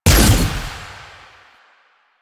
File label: Fire Shot; Duviri; Incarnon Adapters; Incarnon Dex Sybaris; Weapons